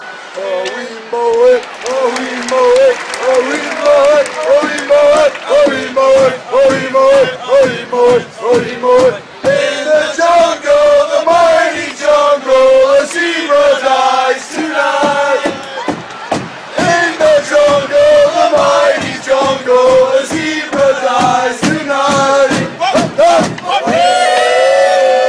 jungle zebra

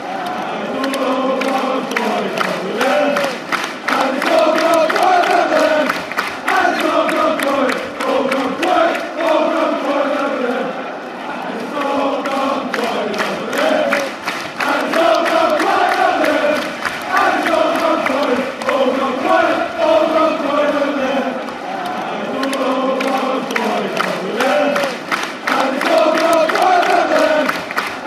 its all gone quiet over there fan chants; avfc

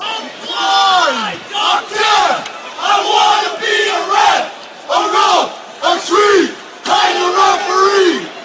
hang ref fanchants

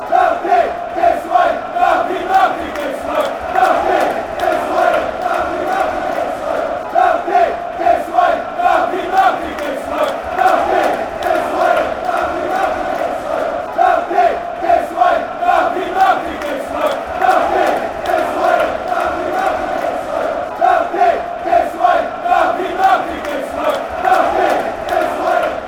wave song, fan chants; malky

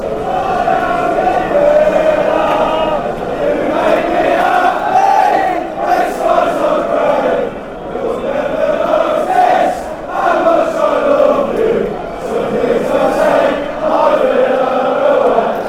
you are my villa fanchants

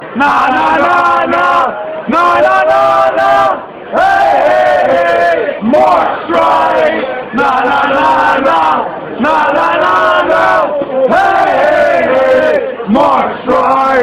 hey hey hey fan chants; mark streit

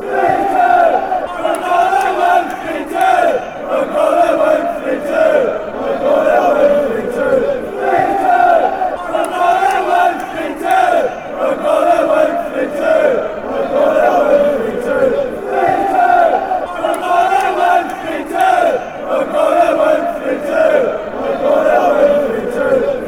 3 2 were gonna win, chants

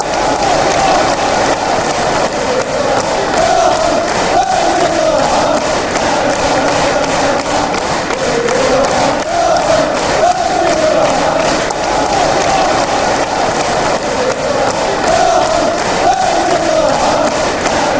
fan chants; hoolahan

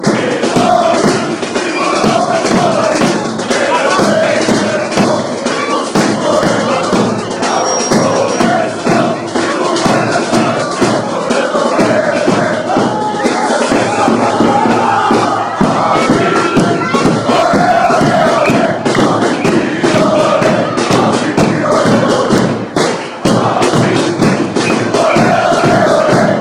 win or lose, fan chants

